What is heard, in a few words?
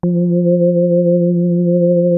KORG F3, synthesizer; Hollywood Edge; Giorgio Moroder Rare Synthesizer Collection